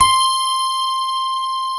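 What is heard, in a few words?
grand piano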